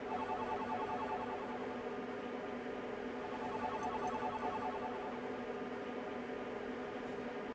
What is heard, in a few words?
Machine